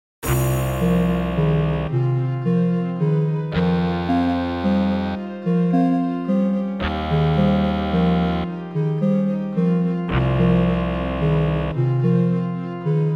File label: mobile in sine warped seven equal